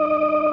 ORCHESTRON D#3; Sonic Reality; Vintage Timetraveler

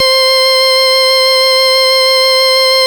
VOX C4; CONTINTL; Big Fish Audio; First Call Vintage Keyboards

